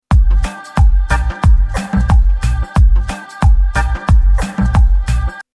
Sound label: loop slowed down